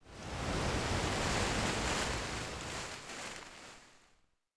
Tree Wind; Ambient; Civ4 warlords

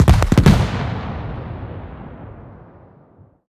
objects, World of Tanks

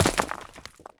small rock impact

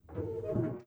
door metal close start; Rust

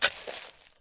Dig Dirt; Civ4 warlords

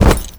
foley run

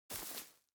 footstep boots grass walk; Rust

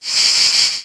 far insects, ambient savanna; World of Tanks Blitz

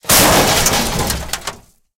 part bounced, tanks

